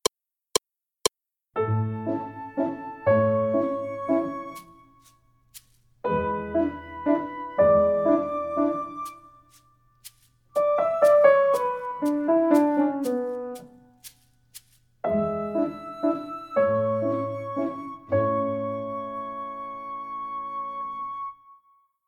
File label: Piano